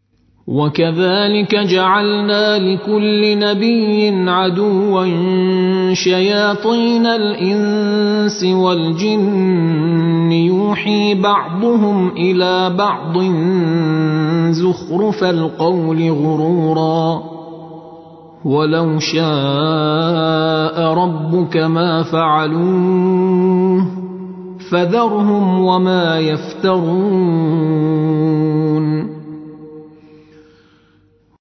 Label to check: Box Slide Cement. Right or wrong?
Wrong